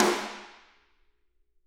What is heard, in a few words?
SD, Drums; Bob Clearmountain Drums I